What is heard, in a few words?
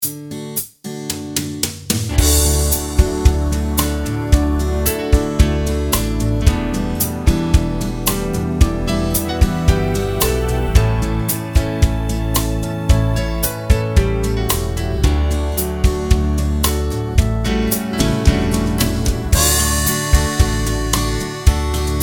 Soft Ballad; AjamSonic